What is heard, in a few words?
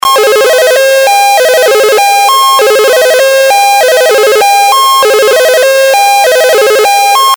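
gated LFO square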